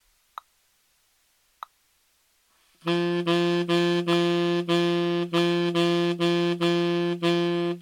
triplets eights saxophone

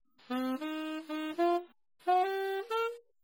slow alto saxophone

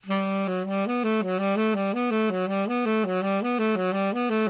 4 measures saxophone; minute waltz

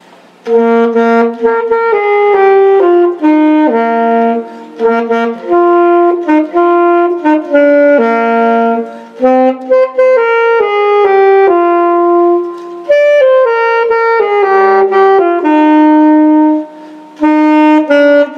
saxophone

